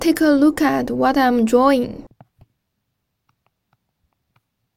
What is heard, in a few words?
draw small